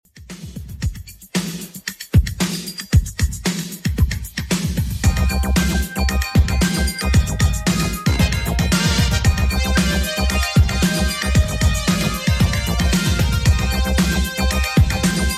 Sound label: 80s Redrum 114bpm